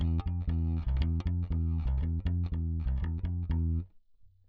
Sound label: bass